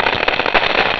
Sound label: tick